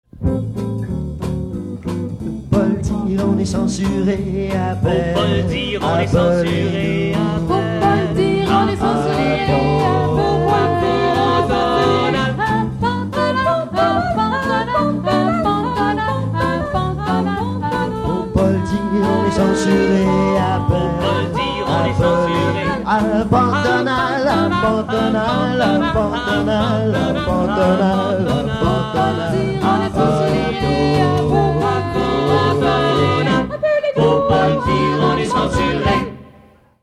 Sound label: jingle swing